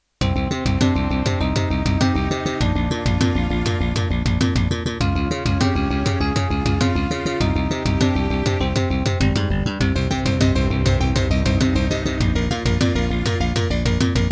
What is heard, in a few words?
Introduction, Serdaco Dreamblaster X16GS NATIVE; Hocus Pocus